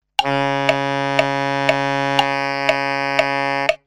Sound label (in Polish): dziura bb 1, saksofon